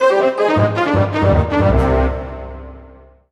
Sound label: Orchestral Brass Negative; MUSIC EFFECT; startide